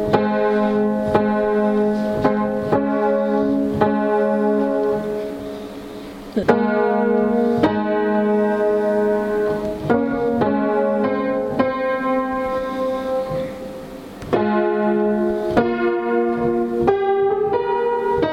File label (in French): piano faux